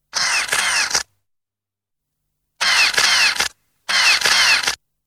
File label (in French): Appareil, Un appareil photo